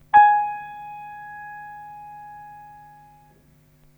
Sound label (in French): corde Seule